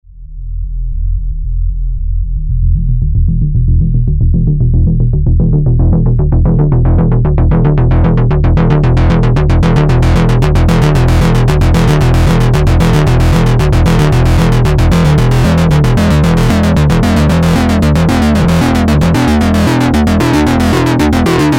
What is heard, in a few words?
mad sync; TriVCO